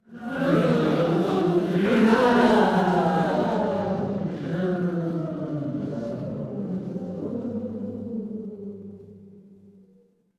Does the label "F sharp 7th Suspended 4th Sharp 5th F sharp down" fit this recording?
No